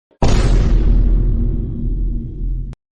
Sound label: boom slowed; vine